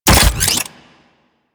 Briefcase Launcher Reload Passive Complete, Weapons; Warframe; Corpus